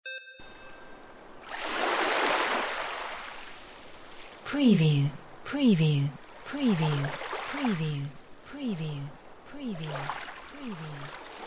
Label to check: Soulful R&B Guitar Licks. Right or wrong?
Wrong